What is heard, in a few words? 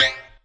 pitchy hit; Weird and Interesting Hits; single hits